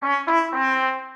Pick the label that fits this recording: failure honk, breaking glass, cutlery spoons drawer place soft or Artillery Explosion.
failure honk